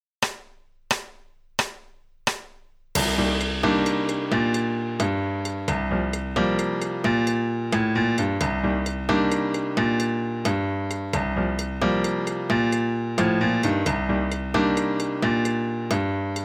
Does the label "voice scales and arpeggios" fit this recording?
No